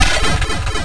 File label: demon hit; mazeofgalious